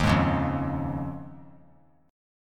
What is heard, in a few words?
Eb 7th Suspended 2nd Suspended 4th Eb; 15,15,16,15; Eb7sus2sus4 strummed; JGuitar